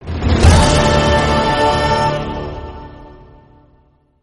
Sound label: quest completed; witcher 3